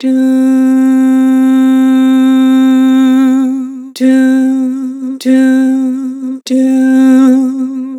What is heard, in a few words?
Vibrato "Doo", Vocals; Harmor Vocals